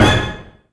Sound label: KORG 01W KIT; Rubber Chicken Software Sample Bank